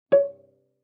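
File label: Cello pizz fortissimo sul A Db4; MIS Pitches - 2014; Strings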